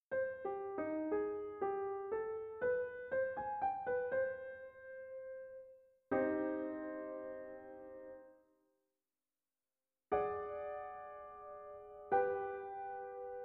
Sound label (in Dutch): gealtereerd, gealtereerde akkoorden